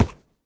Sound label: wood, horse; minecraft